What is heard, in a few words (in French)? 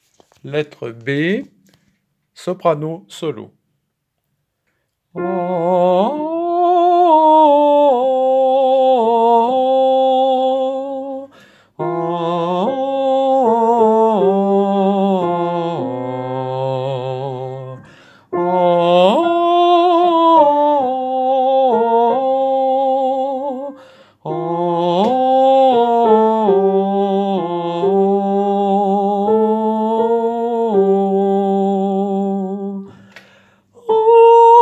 hymne des fraternisés soprano solo